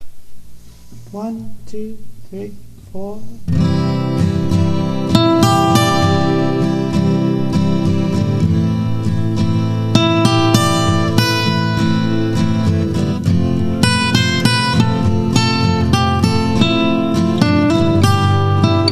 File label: SOLOING, guitar